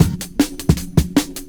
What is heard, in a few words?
break